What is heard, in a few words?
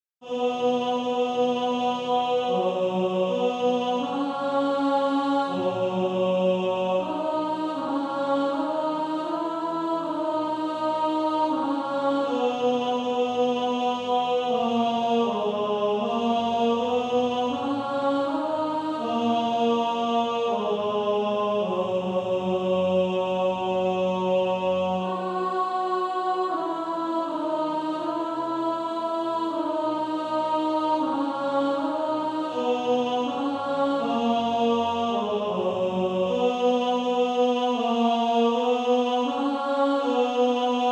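Male Choir, tenor 2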